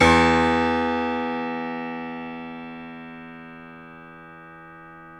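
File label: piano, Grand piano; Club-50 - Foundations Roland; Grand Pno 1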